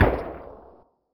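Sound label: hgrunt machine gun distant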